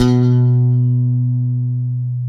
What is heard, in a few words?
stick, Chapman Stick; Analog Odyssey